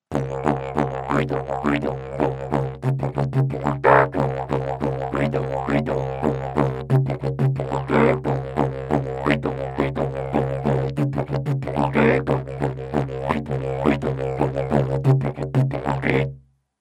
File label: aboriginal rithm 6-8; ka-ka-kauki-tauki-ta